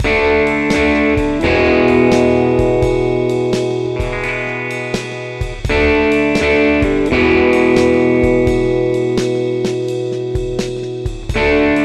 Fender American Original 60 Jazzmaster Bridge Pickup